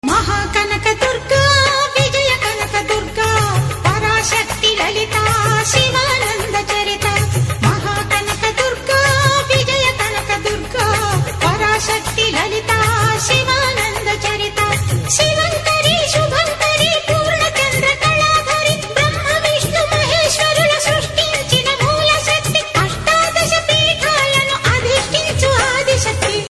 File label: Durga Ringtone, Devotional; Maha Kanaka; Navratri Special Durga Mata Ringtones